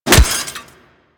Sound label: Melee Staff Impact Robotic Flesh; Warframe; Weapons; Staffs